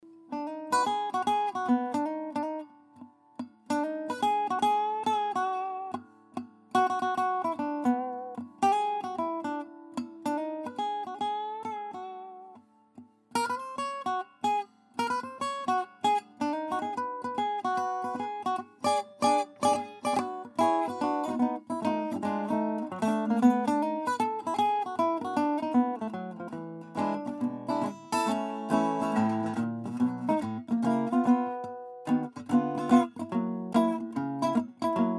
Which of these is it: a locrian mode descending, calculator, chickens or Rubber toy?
chickens